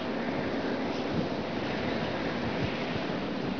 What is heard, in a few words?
wind; tfc